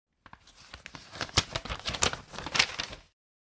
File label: envelope; باز کردن